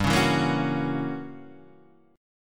F sharp 7th G, chord; strummed; JGuitar

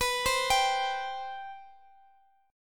C 5th B; C5/B arpeggiated

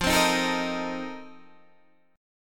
F 11th, Guitar, DADGAD

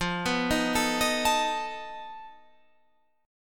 F 7th Flat 5th F down

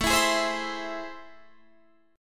A Minor Major 7th

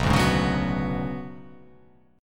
B Minor 6th Add 9th B; Bm6add9 strummed; JGuitar

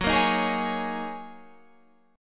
G sharp 7th Suspended 2nd Suspended 4th, guitar; 14,13,13,13,14,16; chord shape

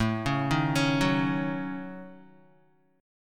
A 6th Flat 5th, Guitar; A6b5; arpeggiated; JGuitar

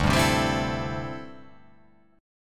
D 9th Suspended 4th D down; strummed; JGuitar

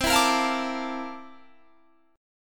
C 13th C down Guitar Standard; strummed; capo=7

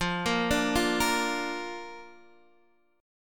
F 6th Suspended 4th F, chord; F64 arpeggiated

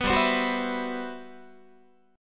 G Major 13th, Guitar; strummed; JGuitar

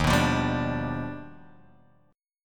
Eb Augmented Major 9th Eb down Guitar Drop D

